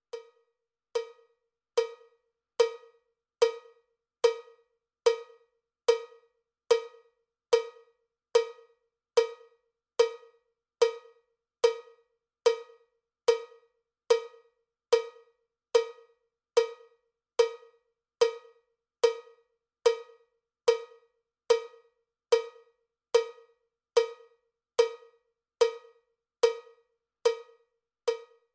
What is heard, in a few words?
cowbell click track bpm 79; cowbell click track bpm 73